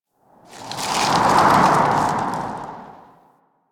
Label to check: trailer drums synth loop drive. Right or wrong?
Wrong